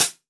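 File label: R8 closed hi hat short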